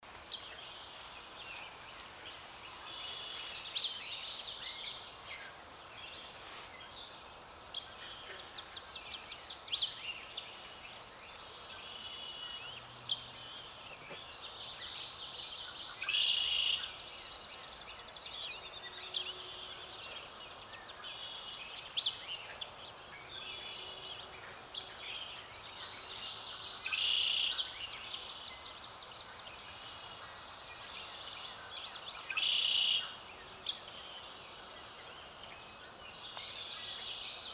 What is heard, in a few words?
Pretty Misc And Blackbirds Prominent Goose